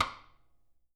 SN OFF CROSS, snares; Sampleheads; Peter Erskine Living Drums

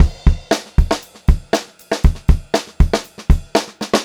POP VARI, Pop-Rock Drumloops; 118 POP

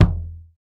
Jazz Kick; Roland BK-5

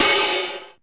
9000 OPHH, LINN 9000; Hollywood Edge; The Big Bad Beatmachine

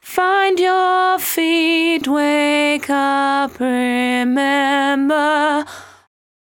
74-5bpm B, Velvet Vocal; While U Could; Zero-G

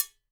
COW MU

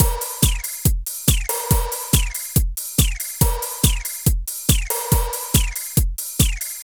Drum Loops

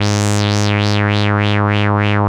wah wah G#; Analogon

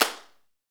PRC XCLAP, Hand Claps

